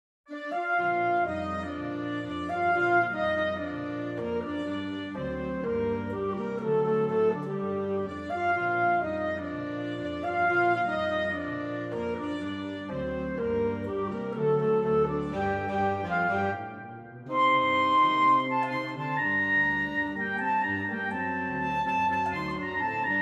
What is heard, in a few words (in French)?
Orchetres Focus Clarinette; Les Mysterieurses Cites d'or